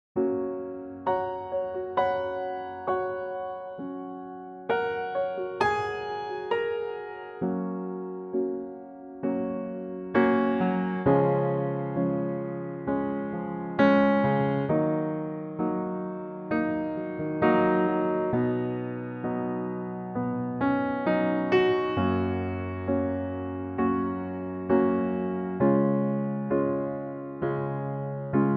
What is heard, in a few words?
piano; key - Gb; Pianotrak; Piano only arrangement